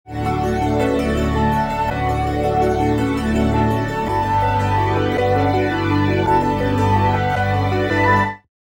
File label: AMBIENT LOOP; FINDING NEMO; Crowdsourced Sample Pack; TRUTH-HZ; Instrumental Loops